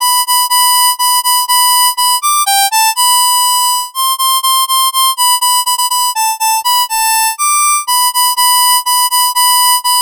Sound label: G easier 122; Jingle Bells